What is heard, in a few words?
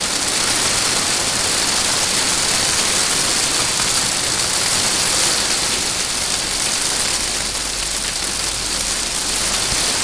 hail storm recorded inside greenhouse